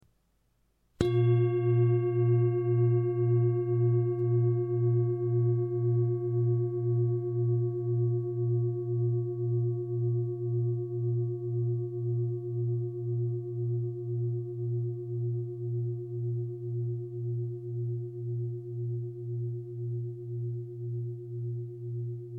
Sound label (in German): Klangschale